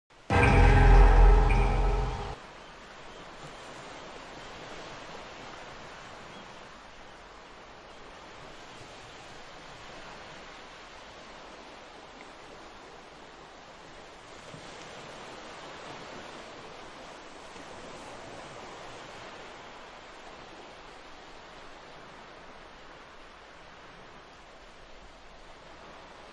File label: Beach Ambience; macos windows gamerip 2023; myhouse.wad